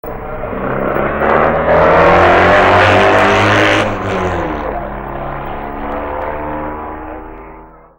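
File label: Norton, motorcycle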